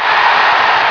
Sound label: crowd